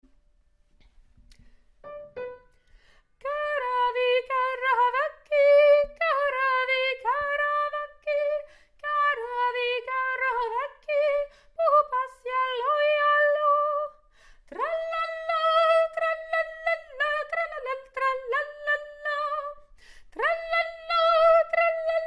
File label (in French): Ténors; Karavaki